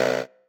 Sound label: marimba bike